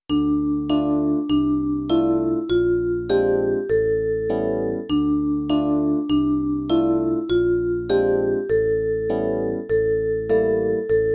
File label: School Music Play Melody in D minor